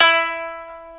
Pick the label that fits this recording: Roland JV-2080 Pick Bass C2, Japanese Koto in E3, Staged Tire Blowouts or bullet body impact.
Japanese Koto in E3